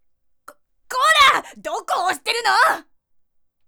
エラー音

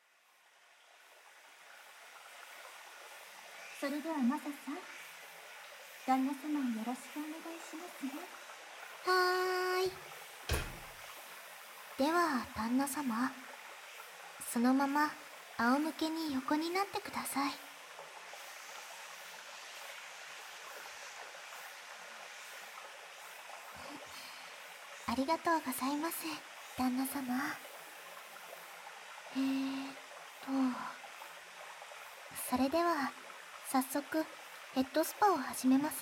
ヘッドスパ

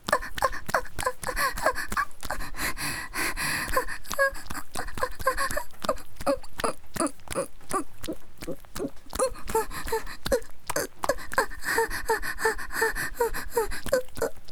ギシアンループ, 雨降り; 無感情ダウナーヤンデレ少女に弱みを握られて…

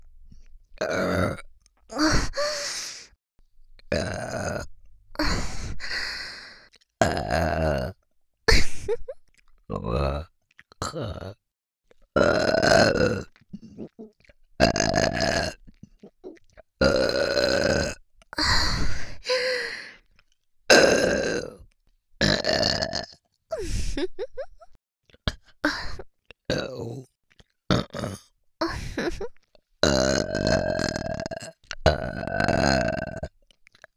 ゲップのみ

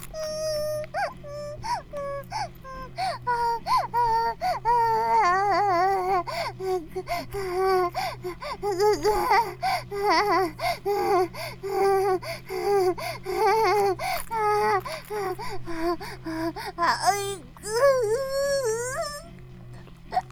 バイブ放置; イクたびにわけて

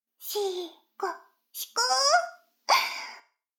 シコシコ音声; 逆時間停止されて

